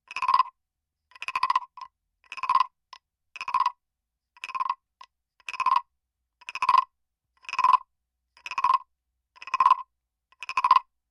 TEMPLE BLOCK WOODEN FROG SEQUENCE, Japanese frog shaped wooden temple block; Sound Effects:Musical